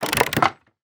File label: Material Wood Lever; Game Essentials